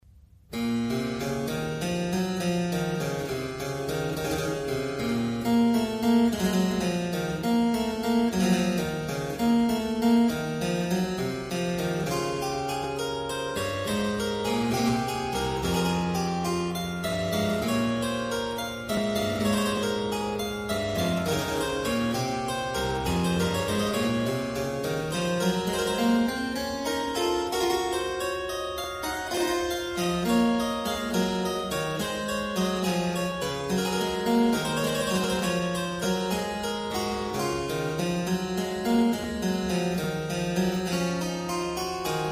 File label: Fughetta in C minor; BWV961; Johann Sebastian Bach